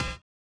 collision; Spectrasonics; Metamorphosis

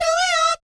DOOEEOT, Vocal Planet Jazz & FX; ILIO

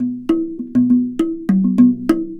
Box Drum Propel, Worldly Rhythms; Sonic Foundry (Sony Creative Software); Ma Ja Le Ethereal Textures

